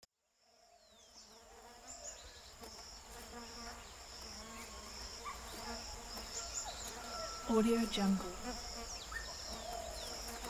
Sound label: farm country ambience; stereomarket